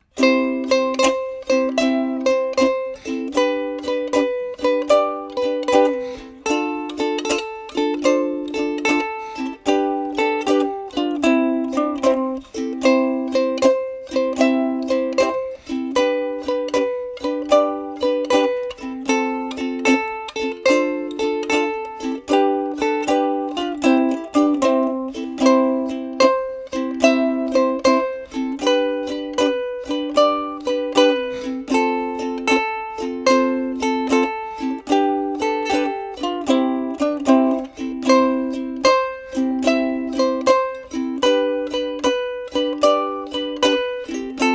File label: Instrumental; Kukuli